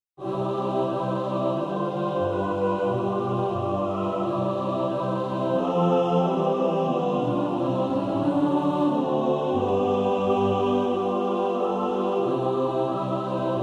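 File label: mixed, practice music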